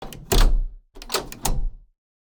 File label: Ambience Scatter Machines; Josh Journey Darkness Totems; PS4, Switch, Windows, Xbox One gamerip; Santuario